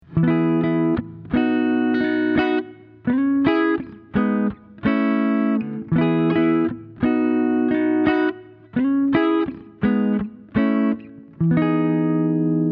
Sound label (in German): Katana Scoop Kompressor